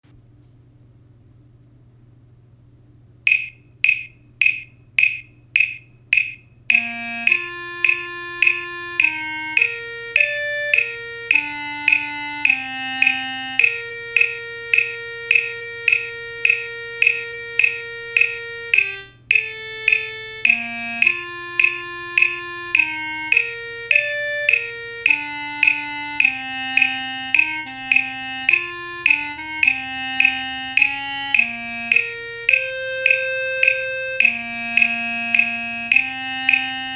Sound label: clarinet